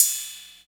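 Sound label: 808 cymbal; East West - Phat & Phunky CD2; 90's Sample CDs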